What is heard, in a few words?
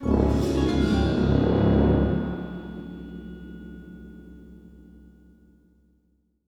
bonus Spin End; netent